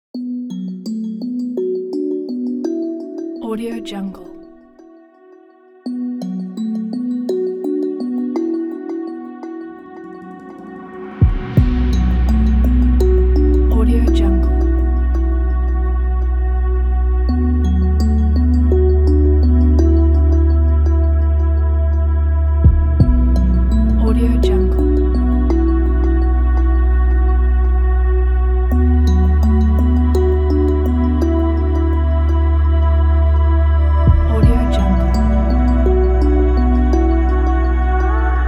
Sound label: meditation